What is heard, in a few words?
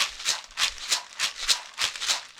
maracas 100 bpm, latin; Sound Effect Library